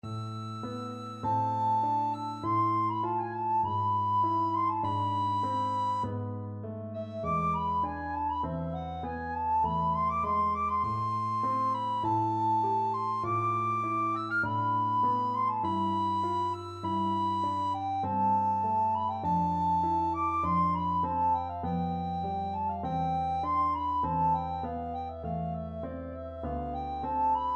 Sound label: recorder